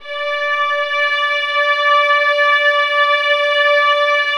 VIOLINS E L, Synclavier Strings; ILIO

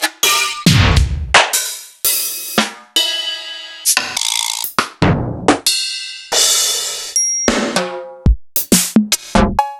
EMU Proteus-2000

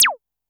korg trident

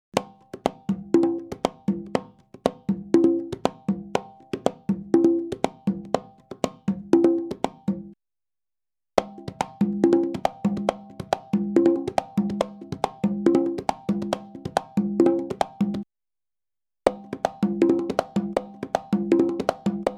Salsa Picante; Masterbits; World Zone VOL-3

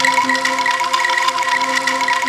ANKLUNGS C4; Big Fish Audio; Synth City

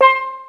STEELDRUM; Kid Nepro; Elite

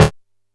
D#2, Bass; E-MU Producer Series Vol. 6 – Analogia Project; Boom da Bass

